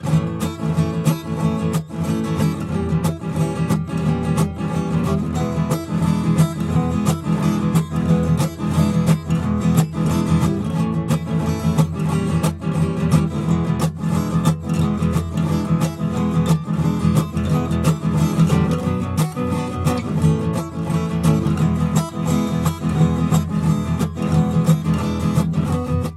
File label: Guitar Music; assassin s creed iii